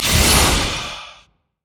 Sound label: ally teleport; LoL; thresh